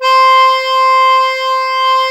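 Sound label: diatonic accordion C4; USB Soundscan vol.23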